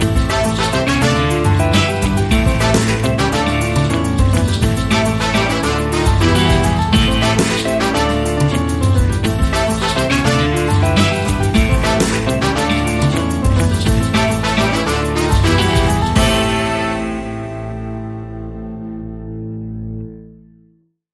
Latin Spanish Salsa Music; bbc

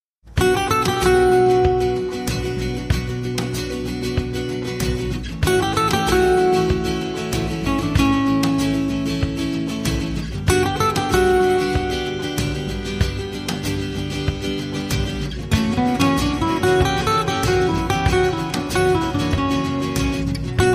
spanish guitar

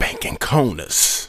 Rap Vox

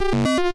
electrical bleeps

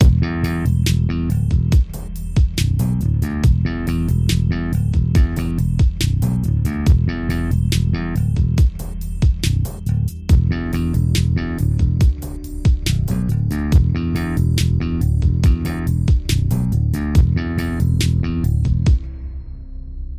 guitar

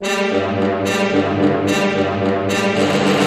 g minor huge cinema brass loop 110 bpm

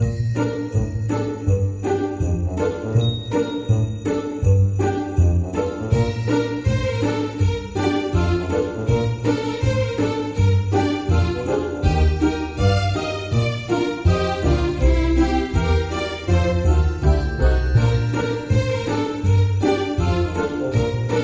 Happy Christmas loop

